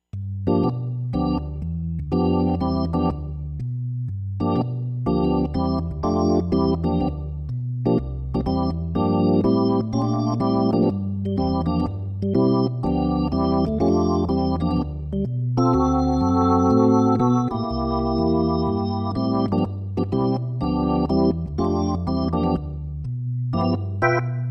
XK-3 Clean

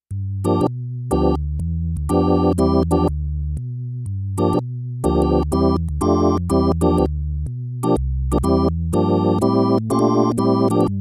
Electro Naked

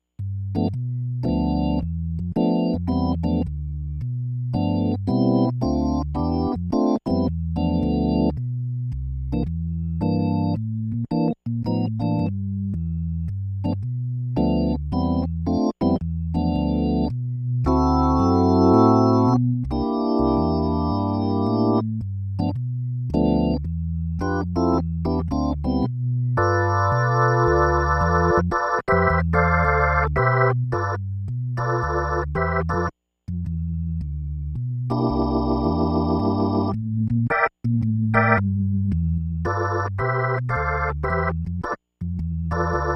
XK-3 Clean

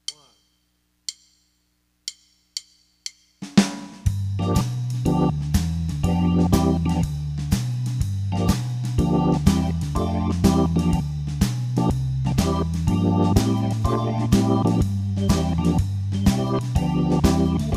B4 w Drums

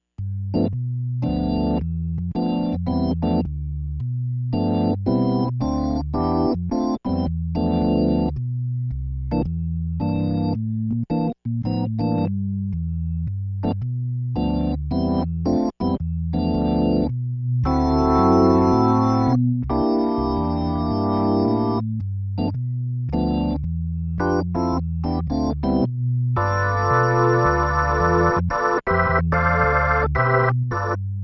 XK-3 Dirty

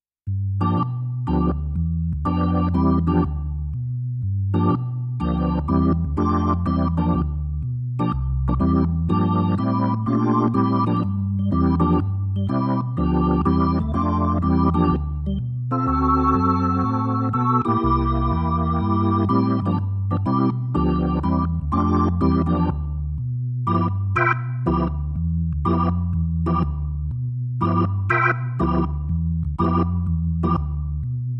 Electro Clean

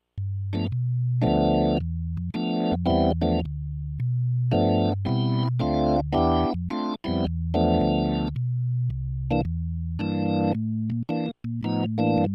CX3 Dirty

